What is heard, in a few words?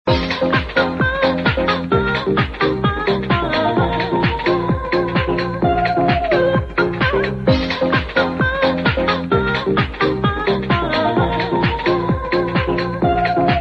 loop ringtone